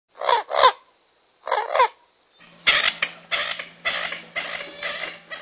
kurka wodna

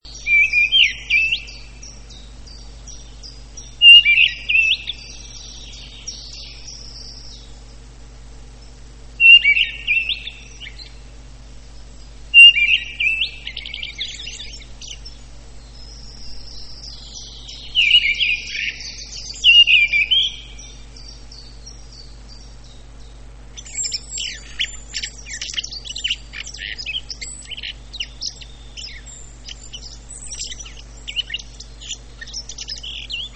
paszkot - śpiew i szczebiot; wroblowe; turdidae; turdus

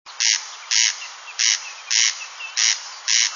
derkacz, chrusciele rallidae; posłuchaj mojego własnego nagrania derkacza

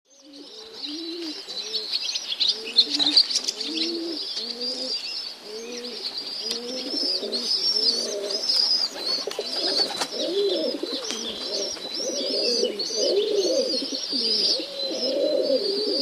golebnik

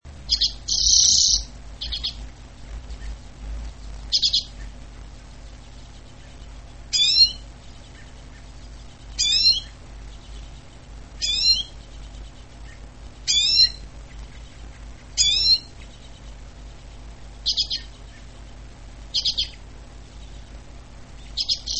czeczotka - tryle i nawoływania

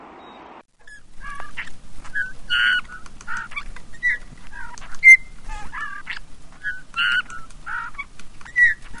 gil - piosenka

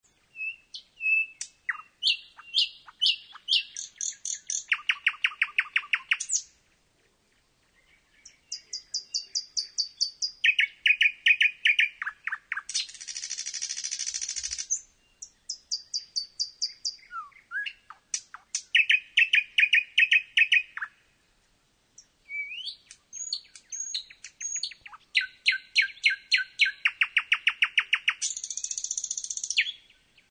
słowik szary - dłuższa kompozycja; luscinia; wroblowe; turdidae

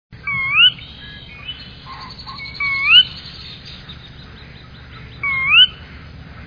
Kureczka karolińska - Porzana carolina; ptak dotychczas w Polsce nieobserwowany

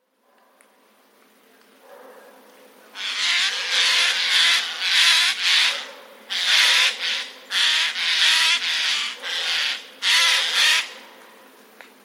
sojki; sójka drze się - alarm w lesie (24.10.2021); Jest to bowiem głos alarmowy

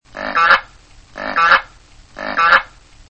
kapodziób

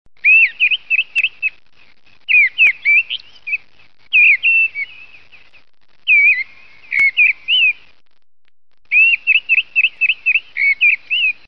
paszkot, turdus; wroblowe; turdidae; paszkot - śpiew

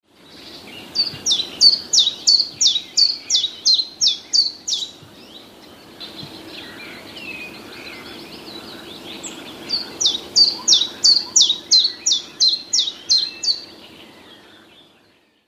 pierwiosnek, śpiew prawdziwego pierwiosnka; wroblowe; zapozyczenia

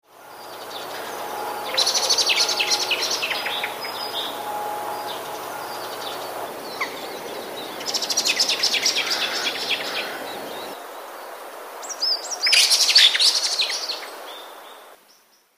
kos zdenerwowany

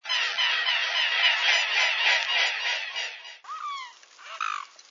łabędź niemy - świst skrzydeł i chrapanie

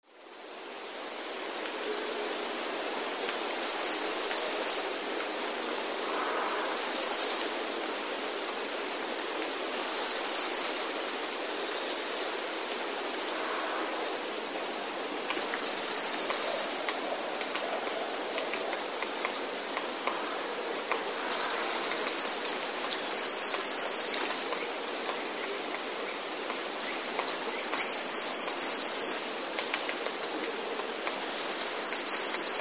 modraszka; Modraszka należy do ptaków śpiewających

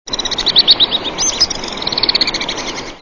jerzyk skalny (alpejski), głosy

